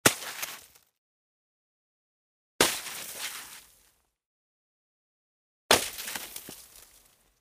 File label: Throw snowballs